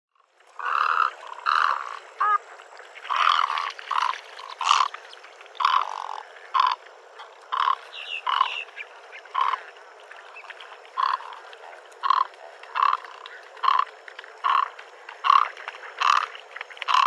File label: Egret Sound